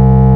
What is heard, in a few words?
BASS C2; Big Fish Audio; Synth City